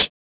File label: mellow closed high hat sample g sharp key; Mono